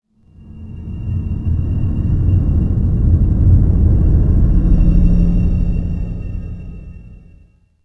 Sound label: City Flyby Large; Ambient; Sid Meier's Civilization 4 Complete; Beyond the Sword